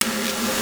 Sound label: DR-660 DNC, DRUMS PERC; BOSS; Rubber Chicken Software Sample Bank